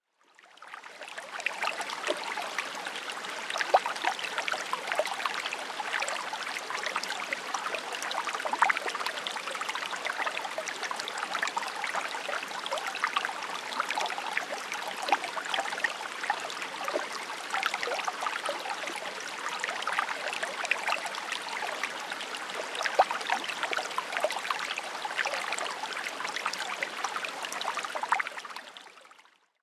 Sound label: Small Creek